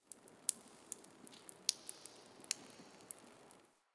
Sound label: Exterior Water Drip; Warframe; Ambience; Nineteen Ninety Nine; DE